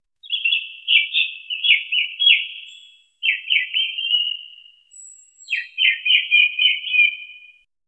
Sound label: a distant bird call echoing through a dense forest